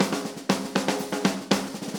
120 March Fast Drums; Pop Rock

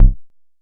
analog quick, Kickbank; mpk mini presets; Ableton Foundation FreePacks

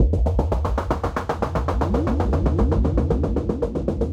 Dark Rhythm Dmin 116 bpm; MIDI Clips; Tonal; Atmospheric; Ableton; Factory Packs; Mood Reel

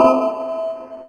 Metal; Retro Synths; Vector; Components; Vector Attacks

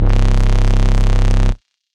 Raw Sinister, Analog Bass; Ableton; Factory Packs; Retro Synths; Analog Bass Vol 1

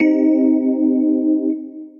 Mellow Tine, Synth Keys; Ableton; Glitch and Wash

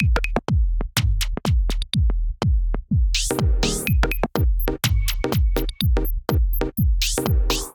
Minimal Kit 124 bpm; MIDI Clips; Minimal Notion Kit; Ableton; Punch and Tilt

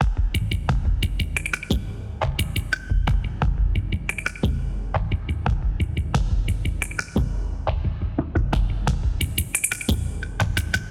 Wood Drip Kit - 88 bpm, drums; MIDI Clips; Ableton; Factory Packs; Mood Reel

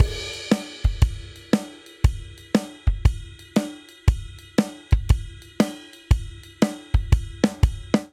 Blues Shuffle Chorus 118 bpm; 4 4; MIDI Clips; Ableton; Factory Packs; Drum Booth

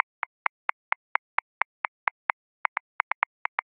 Jungle; Sawka; Ableton

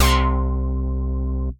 Sharp as a Duck, Synth Lead; Wavetable; Ableton; Factory Packs; Synth Essentials

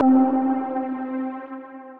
Synth Misc; Duzzalot; Ableton; Beat Tools

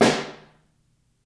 SN3 Room, Snare; Ableton Foundation; Snare Designer

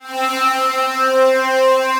Broken Strings Selector, distressed strings; Instruments; Ableton; Mood Reel

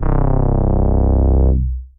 Darker Things Drone, Synth Bass

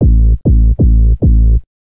Sub A Minor 80 bpm; MIDI Clips; Tonal; Bassline; New Old; Ableton; Factory Packs; Chop and Swing

